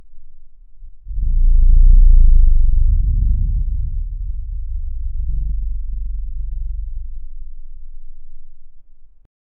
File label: metal handle creak pitch